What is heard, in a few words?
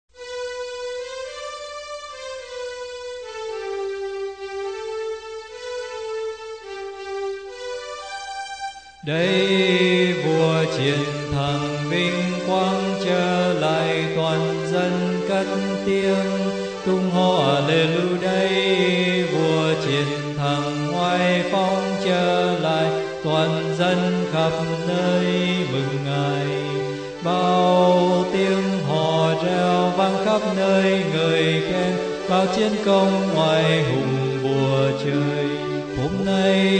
alto